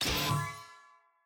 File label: optin click